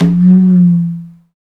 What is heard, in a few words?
PRC XCONGA, Skin Drums